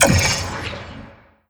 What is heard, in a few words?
impact, physics, vault